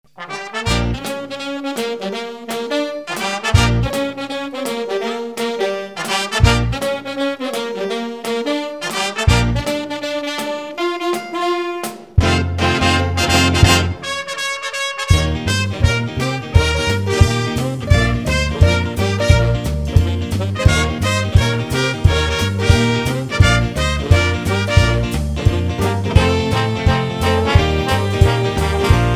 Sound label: Rock